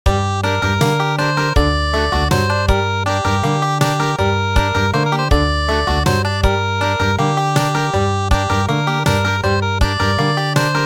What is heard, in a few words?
Country; A little children